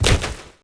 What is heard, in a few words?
log